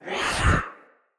Staff Caster Cast Long; Weapons; Staves; Wazzard Staff Caster

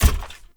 Step Default, Footsteps; Characters; Enemy; Mendicant; MndKing

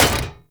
Short Sword Medium Impact Dmg Metal

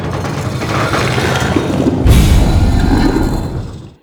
Forest Water Piston Large; Props; Warframe; Grineer Forest

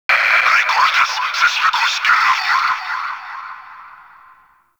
Exterior Notification, Fortress Ambience; Warframe; Grineer

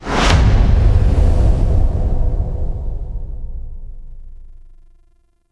End Stinger; Veilbreaker; Devourer Tenno Start

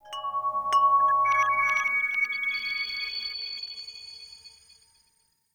Chimes; Warframe; The New War; Narmer Outpost

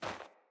Sandals Run Snow, Footsteps; Player

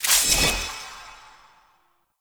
Serpent Ability Cast; Warframe; Warframes; Alchemist

